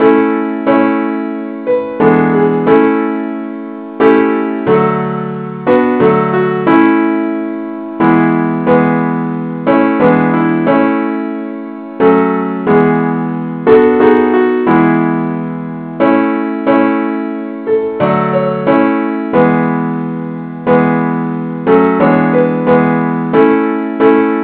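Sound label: simple accompaniment key A; i need thee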